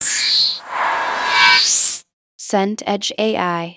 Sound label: whoosh bright mid